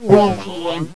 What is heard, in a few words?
gurp; vortex; phantom